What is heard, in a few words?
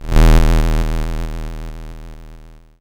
YM FX Womp; SFX; CHIPSHOP DELUXE; CHIPSHOP HITS